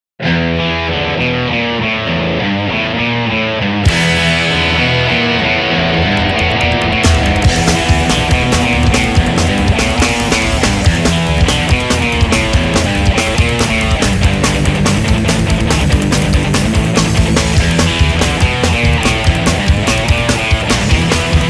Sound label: rockabilly; Rock’n’Roll